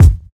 warm sounding kick e key, Royality free kickdrum tuned to the E note. Loudest frequency: 232Hz; kick drum samples